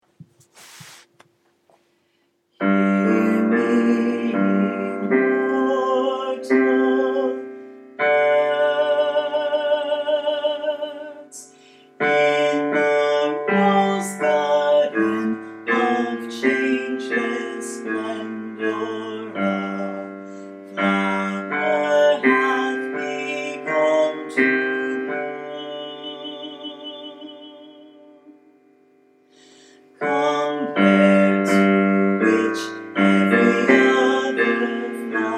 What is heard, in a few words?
bass